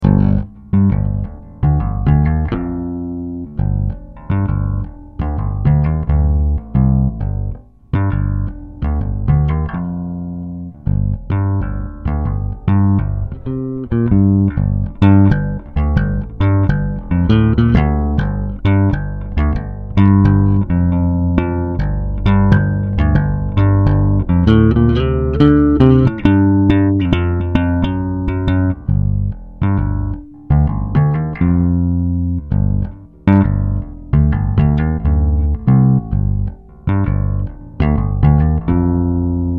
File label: bass sound sample blend; shishido